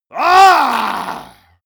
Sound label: monster growl attack throaty; schreie